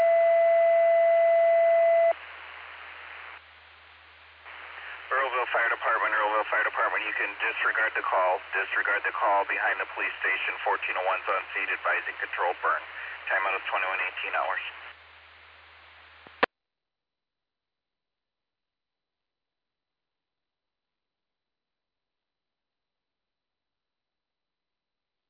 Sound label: Fire; Earlville; TTDAlerts